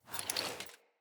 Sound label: Foley Walk; Friendly; Jotar